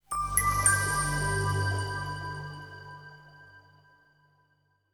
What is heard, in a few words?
Software Logos Bright Shutdown G Major